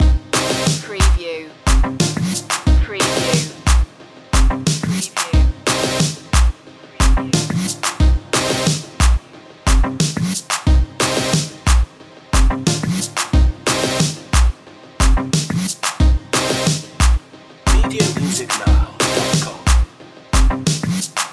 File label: loaded loop